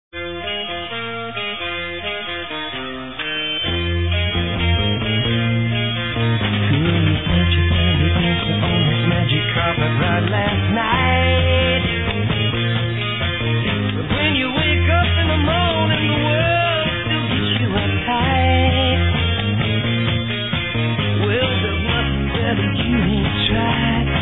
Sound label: kicks